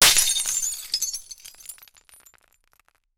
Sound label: a glass apple slipping; break